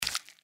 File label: mantis foot; npc